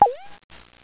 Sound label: cheating